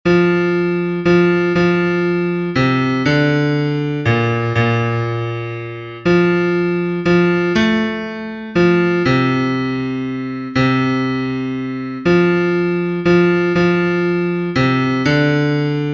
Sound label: Bass